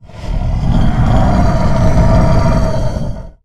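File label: Stealth Attack Start; Evolve; Goliath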